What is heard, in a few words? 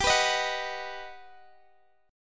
G Augmented Major 7th G down Guitar Standard; G+M7 strummed